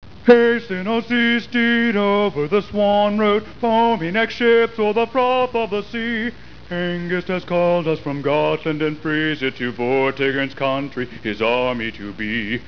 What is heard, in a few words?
shield